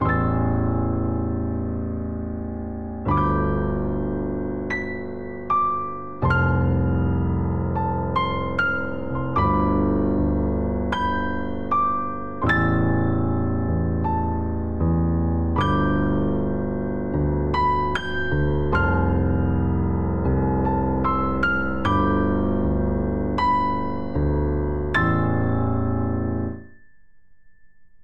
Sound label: Progression Cinematic Broad Two Handed vi V IV V C Major 77 bpm, Grand Piano; MIDI Clips; Ableton; Factory Packs